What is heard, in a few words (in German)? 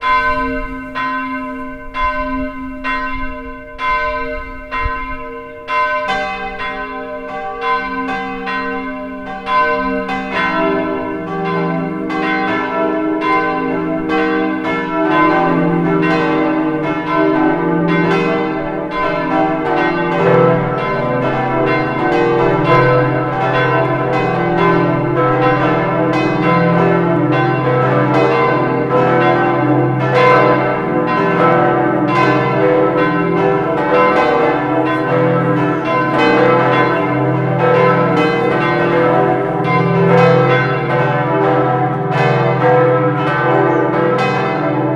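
Vollgeläute von oben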